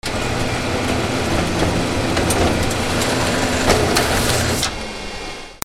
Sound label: OFFICE